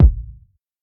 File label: low tone kick one shot a sharp key